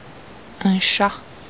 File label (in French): chat; animaux